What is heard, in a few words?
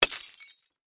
Glass Bottle Smash, Impact, Glass, Bottle, Smash, Crash; Smashes; Objects; Blastwave